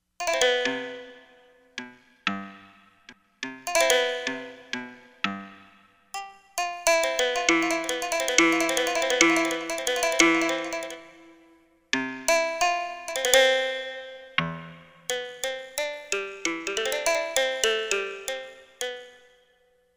Shamisen, Korg MSC-13S Ethnic 2

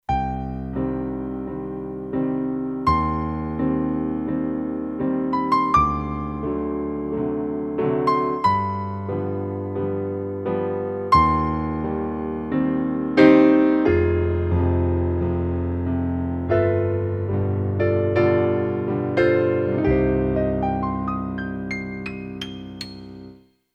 Concert Piano, Kurzweil K2500X; Factory Programs

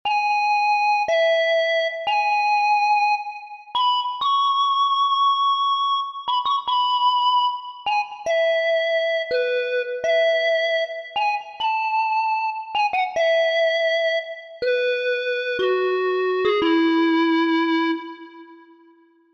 Square Pipe; Korg MSC-1S Variety

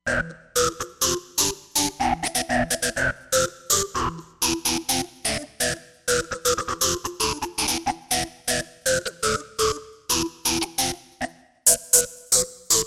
Disto Stab, Roland Fantom XR

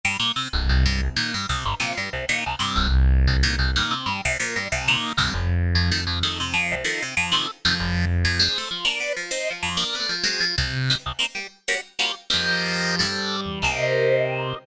Cross Sharp; Generalmusic Equinox

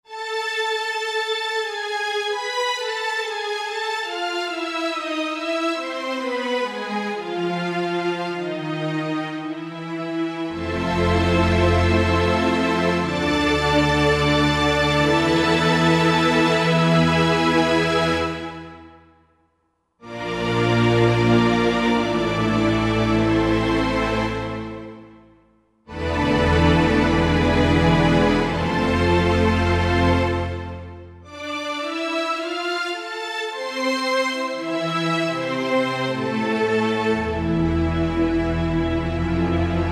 Film Octaves, Roland JV-2080

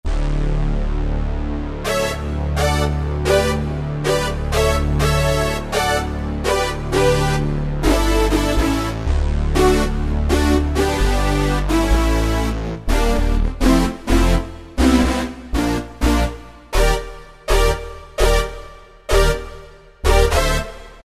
Maxi Stab; Factory Combi demos

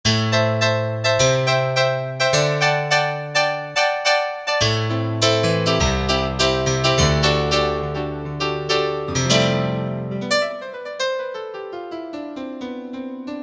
Velo Piano, Korg MSC-1S; Korg MSC-1S Variety

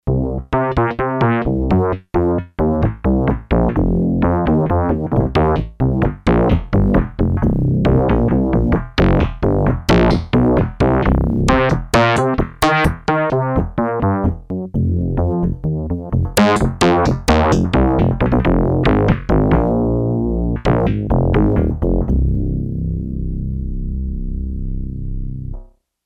Bop Bass, Yamaha TX802; Factory Patches; Voices

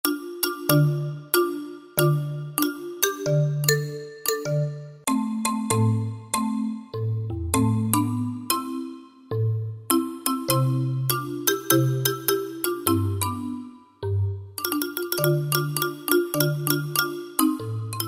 Wood Bars, Kurzweil K2000; Kurzweil K2000 V3

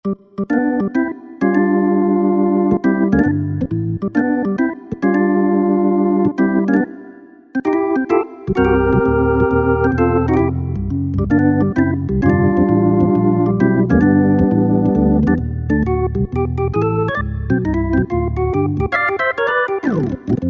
Jazz Organ, Yamaha PSR-3000; Factory Voices

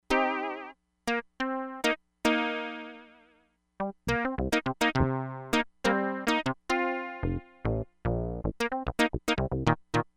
FUNK TOUCH, Crumar Bit 99; Factory Programs

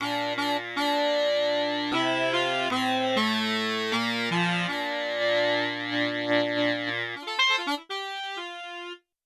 Flanger, Four Saxes; Yamaha TX16W

